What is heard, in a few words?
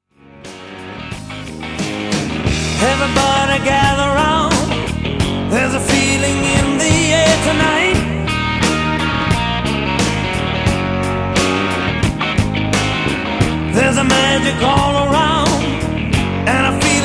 Low Down Blues